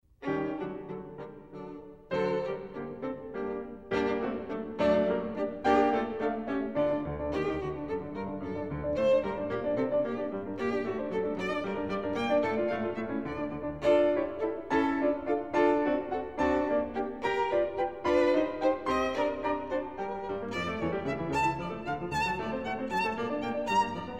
Beethoven Violin Sonata 10 In G, Op. 96, 'The Cockcrow' - 3. Scherzo Allegro